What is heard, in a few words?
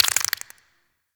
Infested Burst Rifle Mech; Weapons